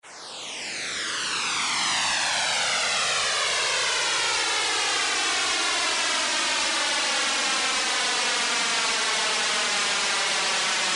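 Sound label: FLANGED NOISE; Akai SG01v; FLANGED NOIZ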